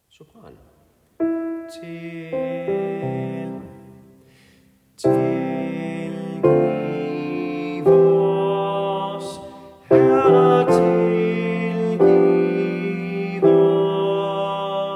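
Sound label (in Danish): Tilgiv os, soprano, folkekor; laudate kirkens folkekor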